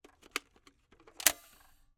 Camera, Kodak EK2 Instant, polaroid, large, plastic, springs, cartridge release; Eiravaein Works; Vaeyan IV